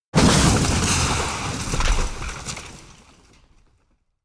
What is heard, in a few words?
large wooden crate being smashed by car